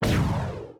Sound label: missile; Mindustry